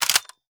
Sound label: m24 back, weapons